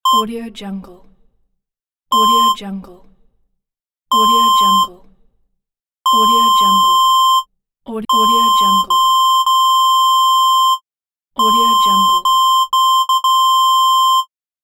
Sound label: censor beeps